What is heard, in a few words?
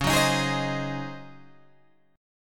Db Major 7th Suspended 2nd Suspended 4th, Db, Guitar, Open G